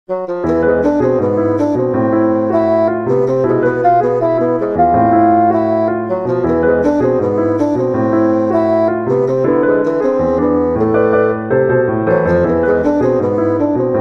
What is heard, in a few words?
bass salsa